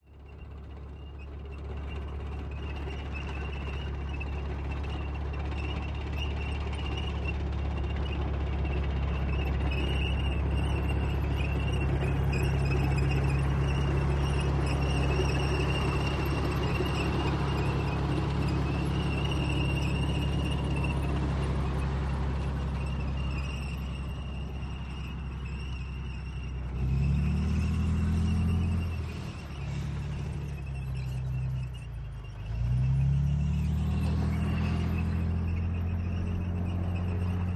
M-60 Tank: Exterior By, Close-up, Circle, In And Off. Stereo